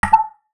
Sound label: SHOT